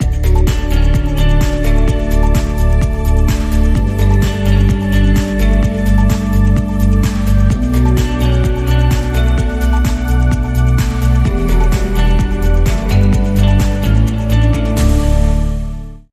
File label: Underscore